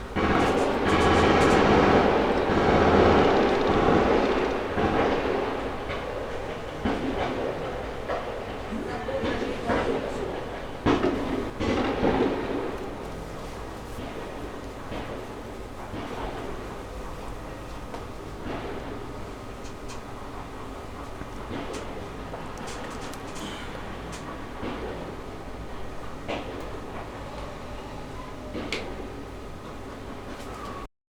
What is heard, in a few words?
Distant Shooting; SFX; IRL Recorded